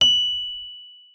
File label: MK1F F#7, RHODES; RHODES A; Xsample VOL-1